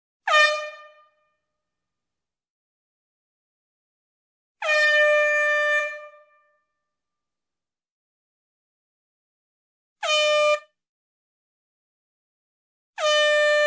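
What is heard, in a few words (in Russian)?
гудок в помещении горн сигнал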